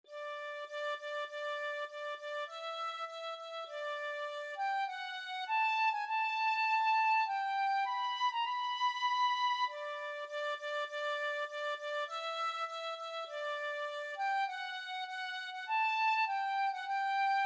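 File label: penny whistle, tin whistle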